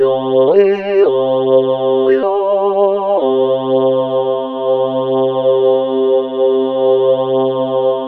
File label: OH BOIZ; MORPHEUS